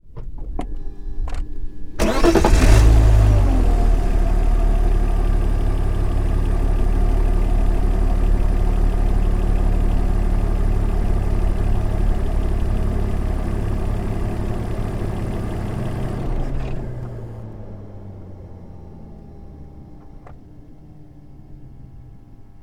BMW E46, Cars, Vehicles, Engine, Start-Up, Idle, Engine Stop; Andrew Vailcross; 3 Series